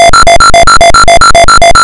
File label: dropped